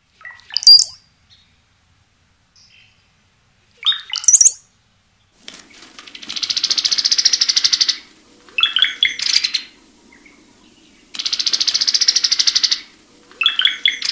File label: Bird Songs Brown-headed Cowbird